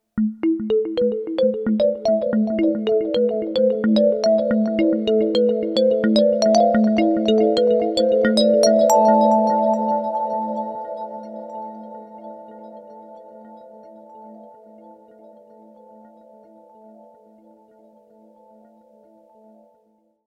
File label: Sh-4d Model FM Space Marimba